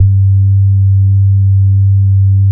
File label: subbass G2; SE-1 BASSES